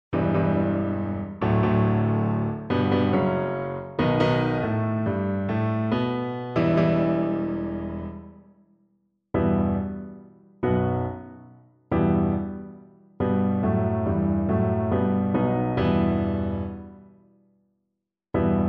awake awake, cello

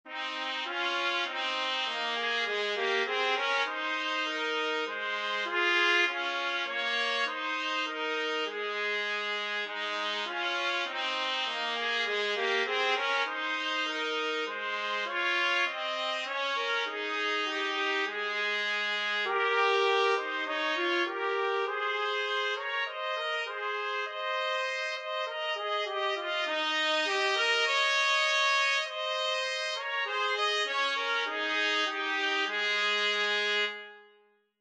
cwm rhondda trumpet duet

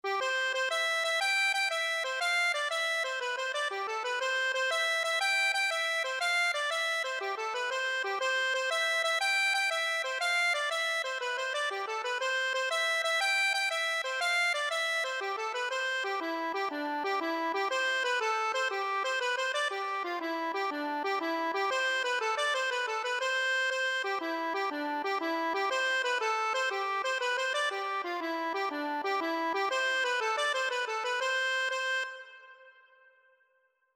accordion, Irish Folk Song; How Is Your Mother?

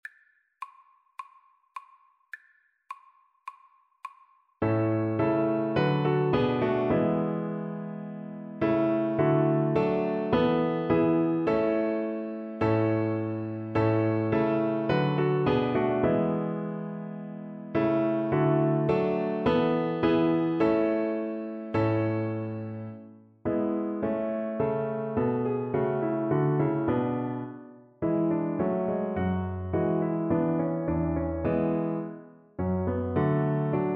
violin

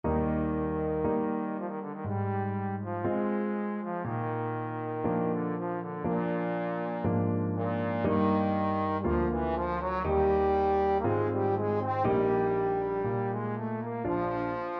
romance, trombone

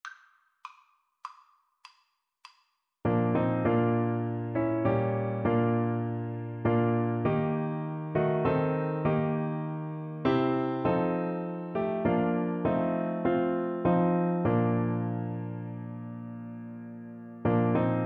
The Old Rugged Cross for Bassoon

